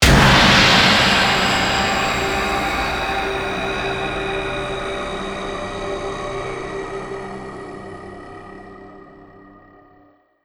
free spin Stop; netent

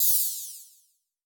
Butter Fly Stick; netent

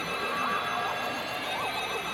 Excitement; netent

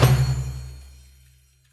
spingle Drum End; netent

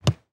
reel bounce; netent

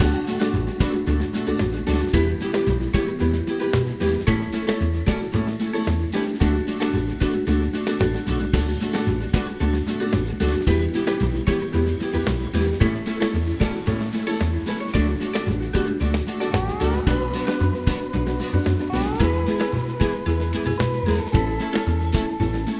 ambience Free Spin; netent

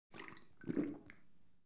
beer; easter egg; netent

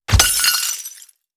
win Explode Medium; netent

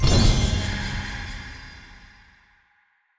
small Win; netent

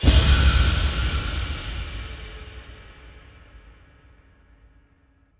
big wins end; netent

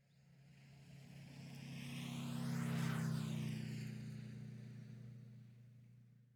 moped; netent

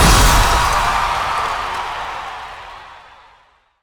bigwin Start; netent

